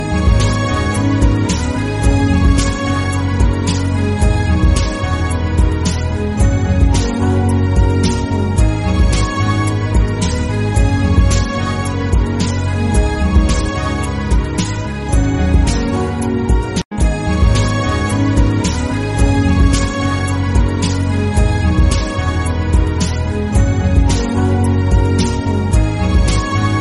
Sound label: romantic love feeling ringtone, bollywood ringtones